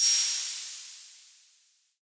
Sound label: roomy crash cymbal one shot f key